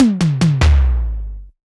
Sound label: tom electrofill, Toms; one shots; SEISMIC Drum Sample Pack; Slate